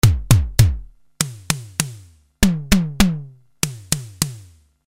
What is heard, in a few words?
kick snare tom, Simmons Drums, SDSV snare